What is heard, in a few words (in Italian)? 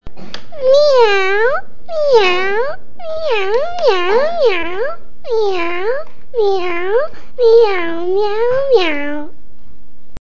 gatto